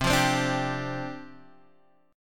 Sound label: C Suspended 2nd Suspended 4th, C, Guitar, Open G; down; chord shape